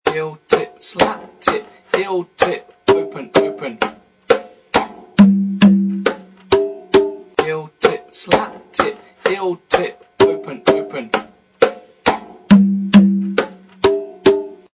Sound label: tumbao basic slow, conga